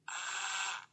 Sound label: drill slow short; SoundsCrate